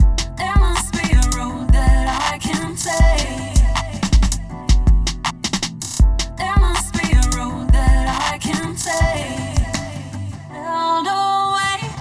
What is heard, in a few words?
Absolute 80s, free loops